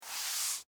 Office, Chalkboard, Erase, Chalk, Eraser, Single, Stroke, Classroom; Miscellaneous Office; BlastWave